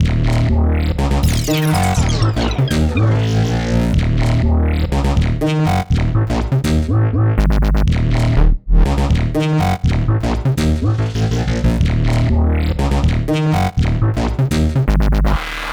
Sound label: 122 B#m Full Mix, Complex Electro Synths; Specters; Prime Loops